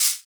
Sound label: Shaker; Equipped Music; Loungin House